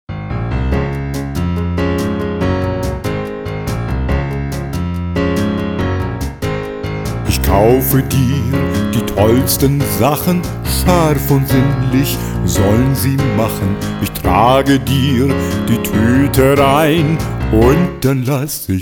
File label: Swing